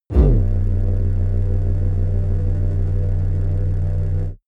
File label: space craft engine start; raumschiff